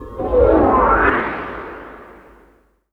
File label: VORLON-FX; Best Service Dream Experience